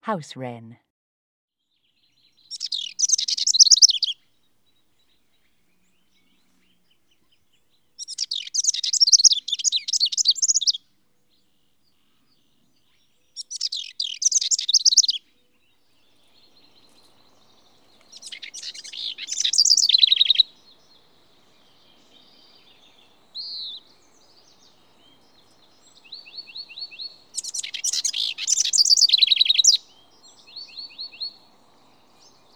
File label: House Wren